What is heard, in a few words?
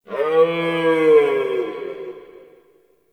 Stag Stampede Vocal; Powers; Stag Pact